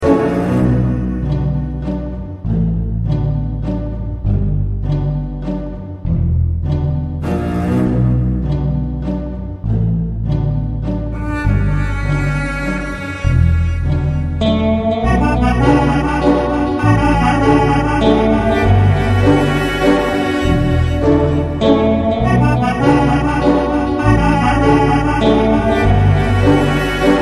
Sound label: waltz